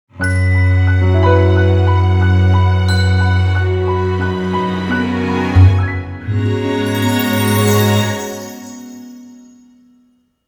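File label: jingle strings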